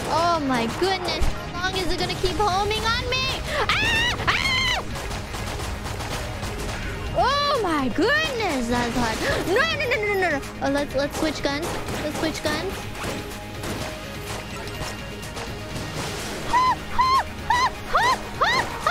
struggling; IRyScream

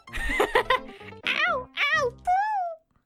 noises; Mario Maker

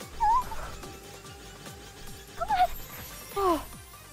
noises

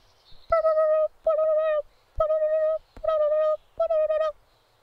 alarm; SpaceRyS

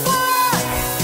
quack

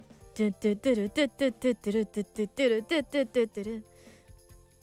Singing; IRySinging

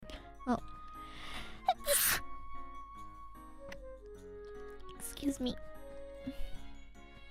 Sneeze

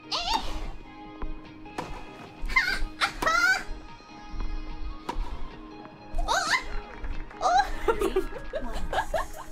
tennis noises

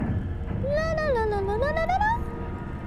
Singing puzzle solved; Zelda

